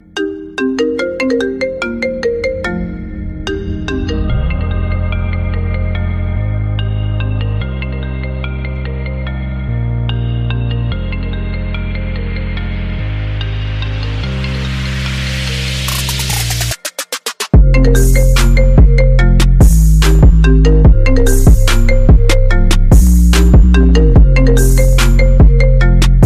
iPhone 8 Ringtone

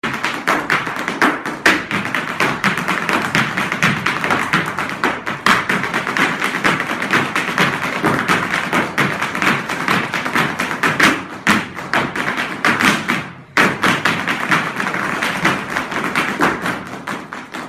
Irish Dance Music Ringtone; ringtones

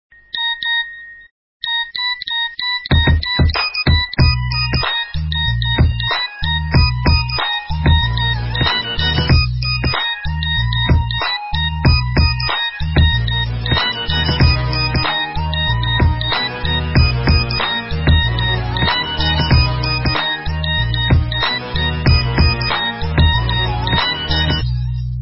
Classic Ringtone for Nokia Mobile; ringtones

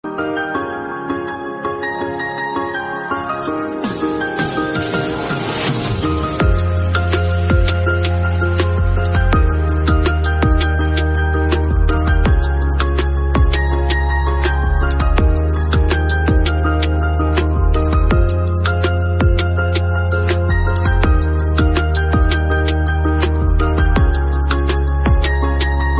Instrumental Song Ringtone

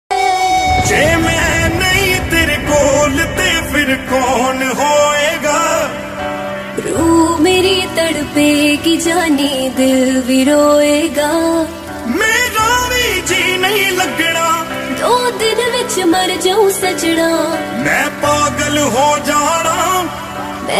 New Punjabi Song Ringtone

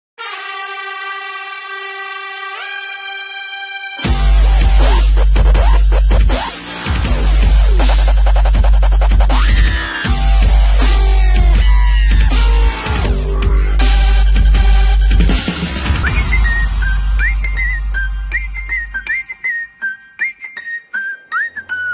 Tamil Movie Ringtone; Theri

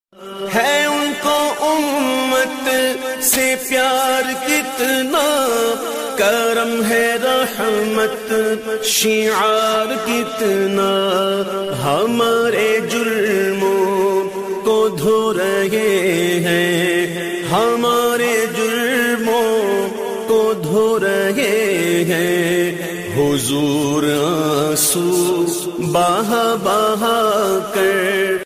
Naat Ringtone

